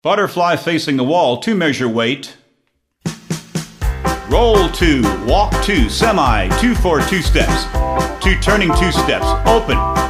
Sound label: vocal sample